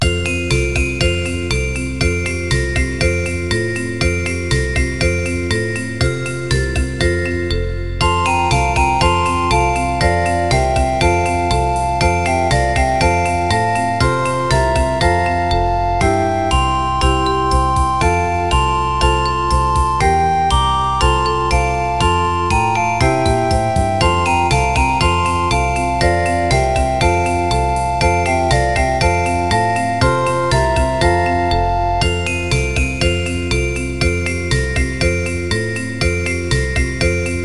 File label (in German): schulmusik froehliche weihnacht ueberall orffpartitur